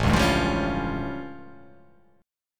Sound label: A Augmented 7th A